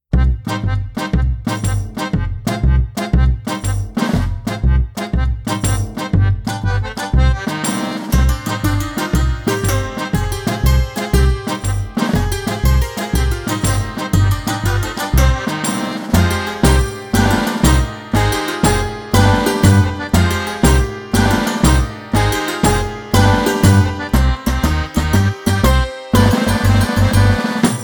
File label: Tarantella Instrumental